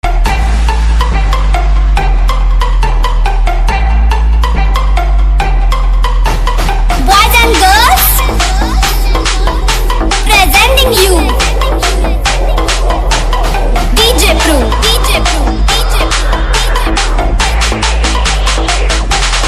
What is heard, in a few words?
trance